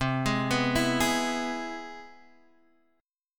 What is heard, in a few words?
C Augmented Major 7th, Guitar, Drop D